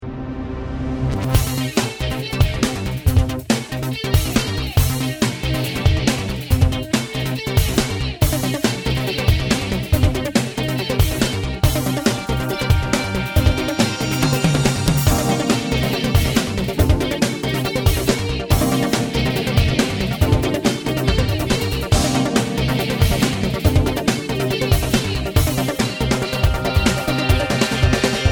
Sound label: Arpeggio; Warner Bros Publications